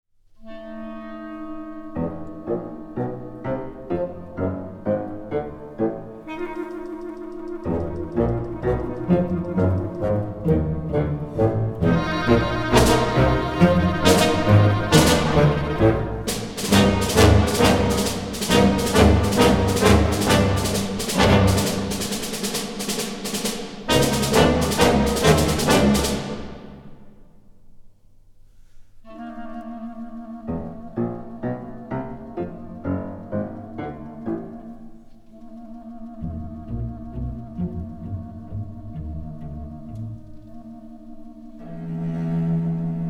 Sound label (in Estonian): Instrumentaal; Eesti Filmimuusika; Eesti Kullafond 1